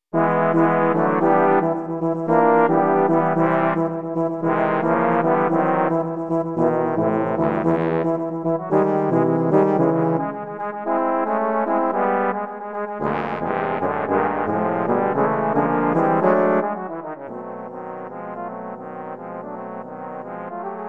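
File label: Bulgarian Rhythm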